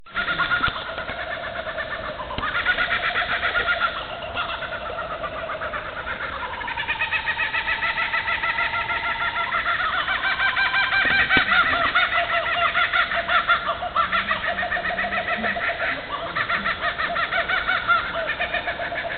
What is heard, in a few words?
Laughing Kookaburra; Gelächter Gesang